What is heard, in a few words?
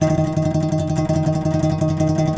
C. Bass Balalaika, Plucked Strings